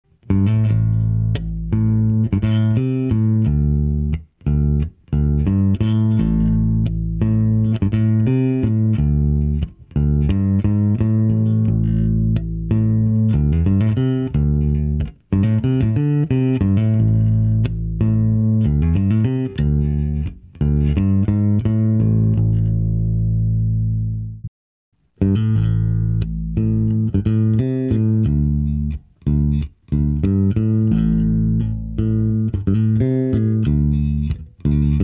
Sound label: STM900 faital jazz bass; SVT7PRO